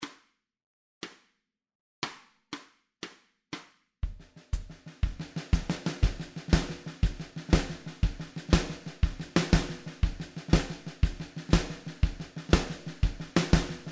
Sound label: Snare